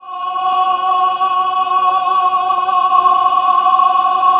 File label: CHOIR; Best Service; Robot Computers